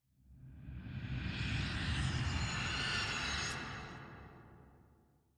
诡异森林呼救声; ambient

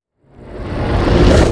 音爆蓄力声; 监守者

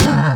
骆驼受伤声

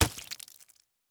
溺尸脚步声

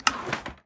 织布机选择图案声

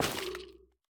幽匿脉络破坏声

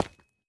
方解石踩踏声

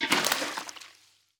鱼桶倒出声

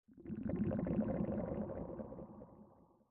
潮涌核心短声

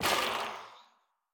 幽匿尖啸体破坏声

